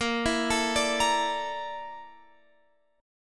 Bb Major 7th Flat 5th, Guitar, Open F; arpeggiated

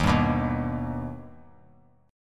D sharp Augmented D sharp, chord; D#+ strummed; JGuitar

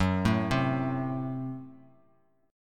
Gb Diminished, down, Bass Standard; arpeggiated; JGuitar

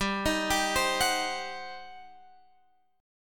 G Major 7th Suspended 4th Sharp 5th, G, down

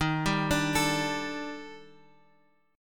Eb Major Sharp 11th, down, Guitar, Open F; arpeggiated

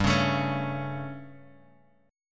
G Suspended 2nd Flat 5th G, Guitar, Drop D; chordshape; down

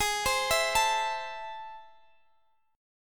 A Minor G sharp, guitar; Am/G#; arpeggiated

